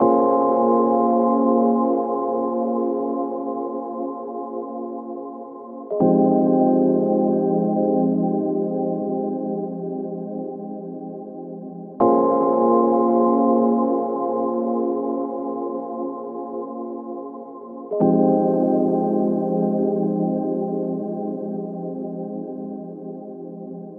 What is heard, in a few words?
Dreamscape Melodic Ambience; Sample Pack; LANDR